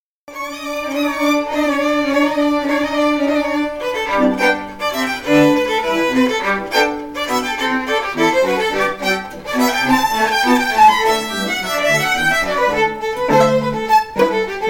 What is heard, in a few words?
Hoedown